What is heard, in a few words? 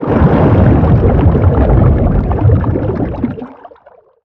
creature shadow leviathan swim patrol